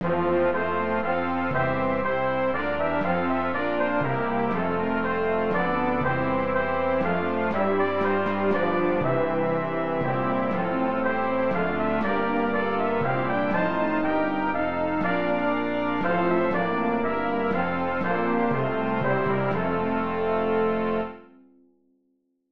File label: Hymn Brass; Hark! The Glad Sound, or Richmond